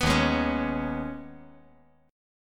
Eb 7th Suspended 4th Sharp 5th

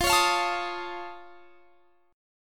F Augmented 7th F, chord; F+7 strummed; x,20,23,22,22,23

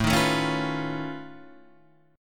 B 9th Flat 5th A

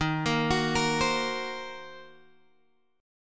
Eb Minor 6th, Guitar Open F; Ebm6 arpeggiated

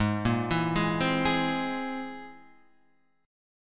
C Augmented 7th Ab

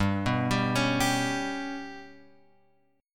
G Major Flat 5th chord; GMb5 Chord {3 2 X 0 2 3} arpeggiated

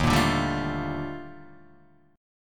Eb Major Sharp 11th Eb Guitar Open D

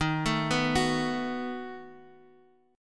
B 7th D sharp

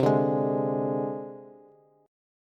C Suspended 2nd Sharp 5th, down, Bass; Csus2#5 strummed; JGuitar

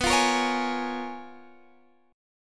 Bb Minor 7th B; Bbm7/B strummed

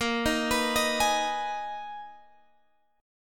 Ab Minor Bb, chord; Abm/Bb; arpeggiated

